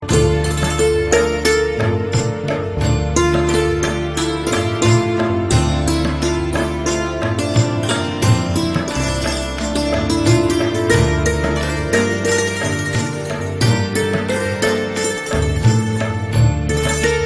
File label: soft santoor; classical